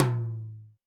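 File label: Tom Hi Dynamic, Alesis D4 drum machine; 300 Drum Machines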